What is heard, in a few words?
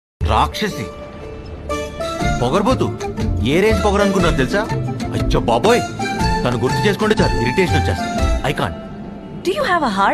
telugu love songs